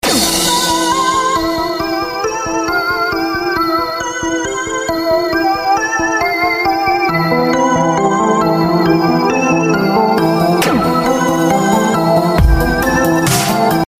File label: synth riffs